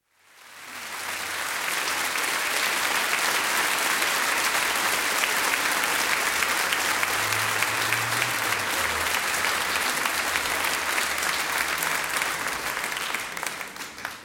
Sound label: General applause in large hall, ends; Applause Sound Effects; BBC